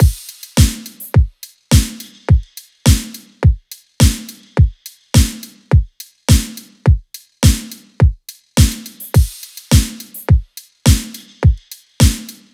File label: drums 105, Drum Loops, Full Drums; Gclass; Slate; MIDNIGHT Sample Pack